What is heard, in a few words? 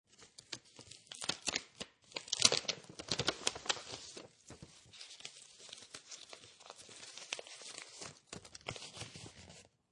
Envelope Open, Opening a paper envelope and crunching it up.; Classroom; Schools